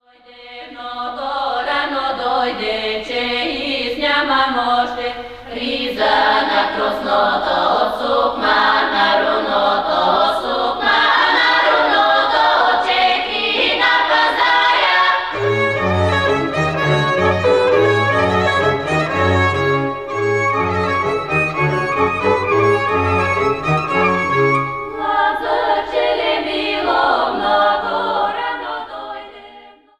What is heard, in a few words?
female choir, dancing; dancing song